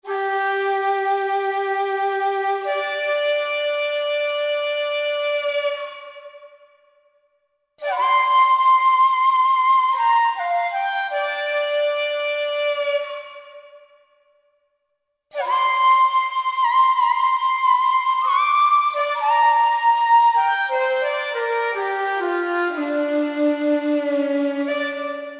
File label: Shakueko; World; Roland SR-JV80-05